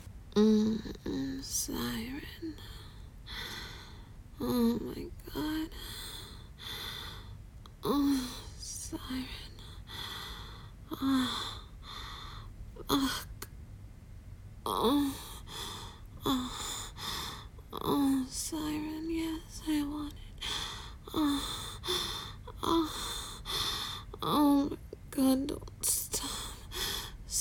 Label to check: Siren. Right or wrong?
Right